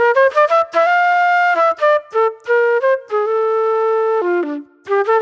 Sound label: J-Funk Flute, Call And Response